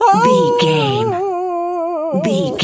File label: wolf hurt